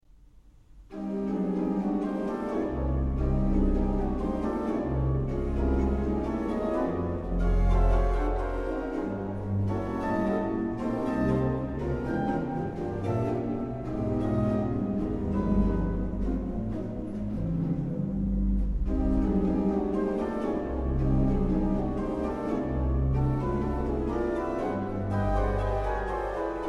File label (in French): Diptyque pour orgue